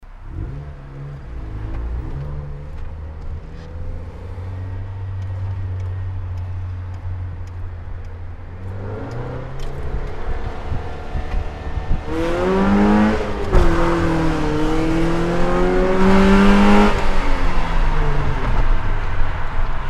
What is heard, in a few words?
inside warm pulling on highway